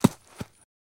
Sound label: Horse Hoof Thud